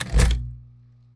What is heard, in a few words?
win item